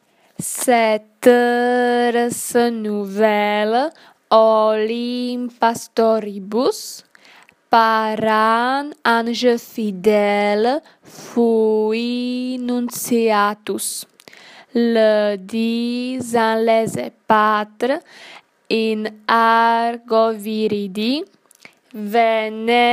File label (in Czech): Sloka 2, Vánoční francouzská píseň, dětský pěvecký sbor